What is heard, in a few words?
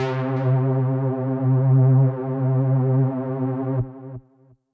Synth C, Synth Prophet One Shots; Electronisounds